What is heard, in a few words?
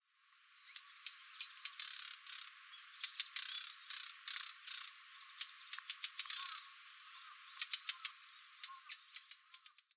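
jobitaki, Daurian Redstart; hyogo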